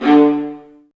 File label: String Sections, Violas Marcato; Roland LCDP13